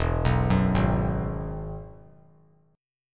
E Major Add 9th; arpeggiated; JGuitar

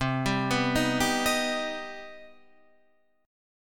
C Minor Major 7th C Guitar Half Step Down

